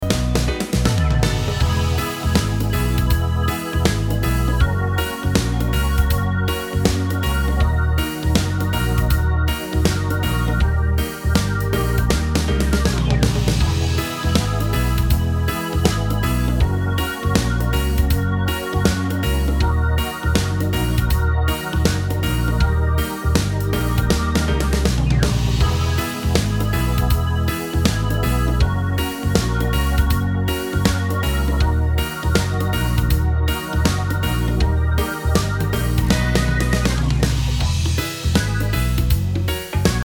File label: reggae